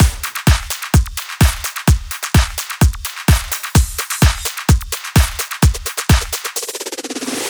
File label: Drum Loop Full, 128BPM